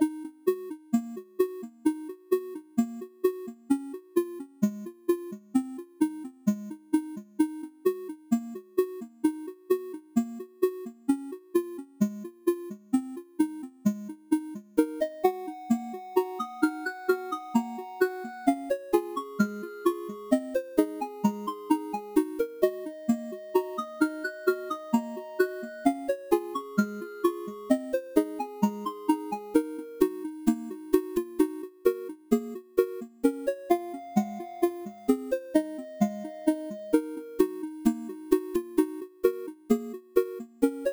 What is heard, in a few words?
tiny sad lonly theme